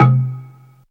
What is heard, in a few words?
Halimba A, Pecussion Hits; Zero-G Jungle Warfare 1, 2 & 3; 90 s Sample CDs